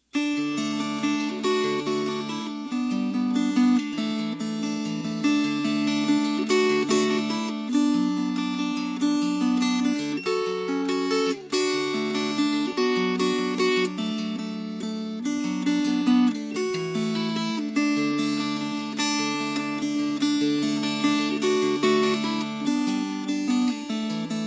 Fingerpicked

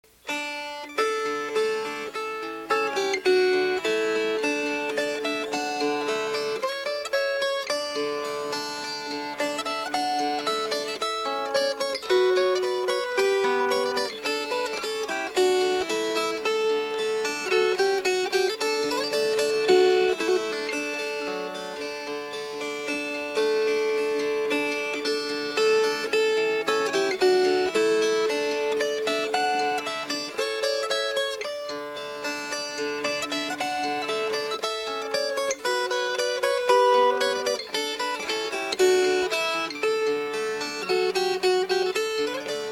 Fingerpicked; Lord Thomas of Winesberry

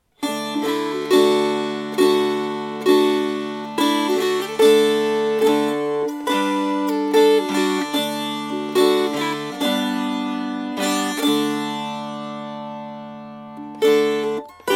Strummed; Dawning of the Day

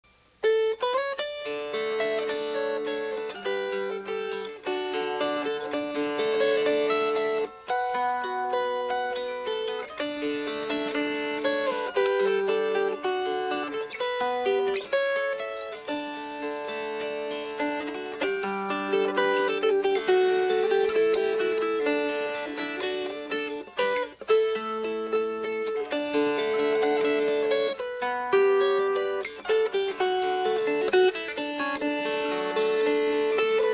Fingerpicked

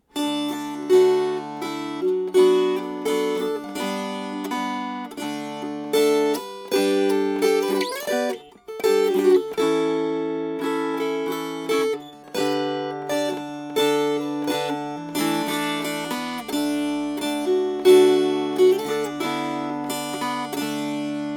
Strummed

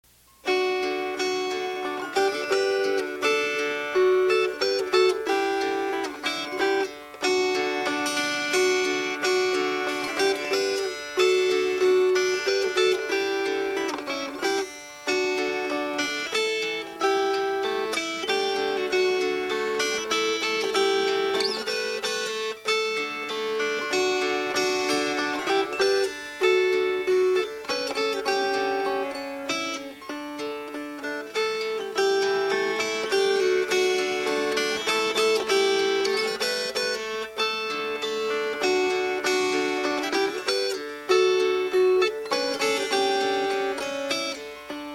Fingerpicked

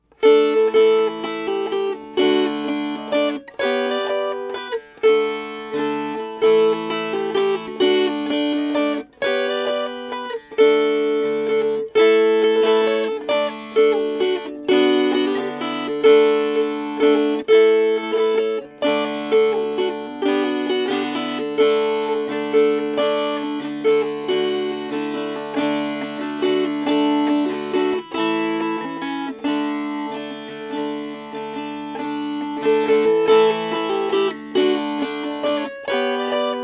Per Spelmann Strummed

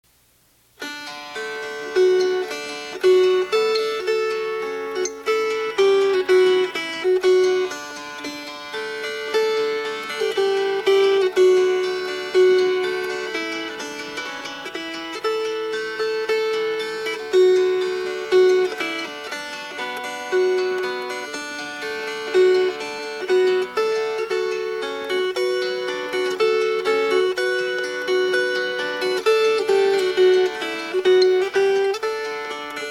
Omega Fingerpicked

